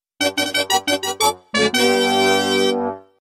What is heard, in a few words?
Fanfare; Mario Race